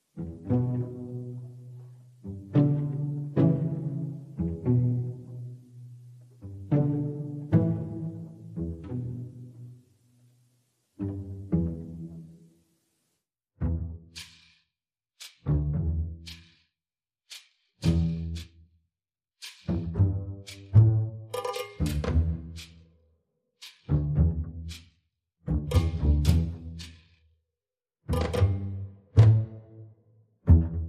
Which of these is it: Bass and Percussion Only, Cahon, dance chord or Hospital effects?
Bass and Percussion Only